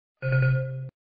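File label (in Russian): windows xp otkaz oborudovaniya, Windows XP hardware failure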